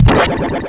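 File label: power up; rocket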